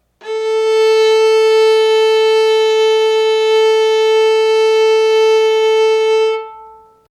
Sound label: Tune Viola A; tuneguide